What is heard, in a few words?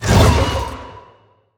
spiders bossfight jump